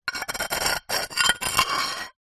household broken pieces ceramic dish edges scrape close